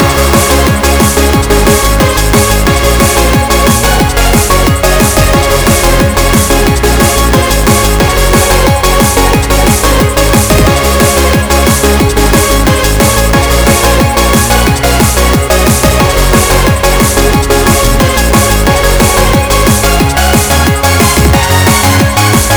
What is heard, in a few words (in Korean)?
피버 모드 BGM; nightward